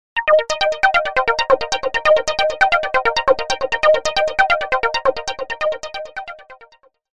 CRAZY TALK, ZTE E850

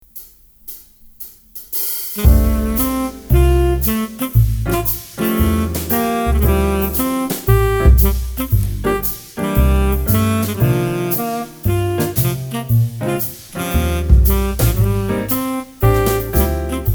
Mi Jazz; Xiaomi MIUI 11; ringtones

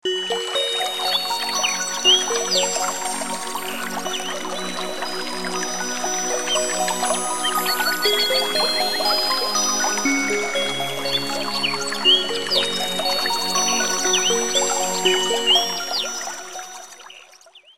Alarm, alarms

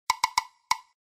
Gyrate, notifications; Coolpad 1827

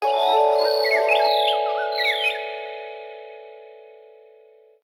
birds of paradise, notifications; Sony Xperia T2 Ultra

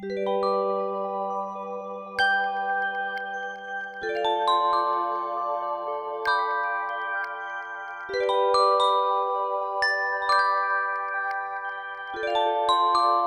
Cosmic Call, alarms; Honor; Magic-7-RSR-Porsche-Design

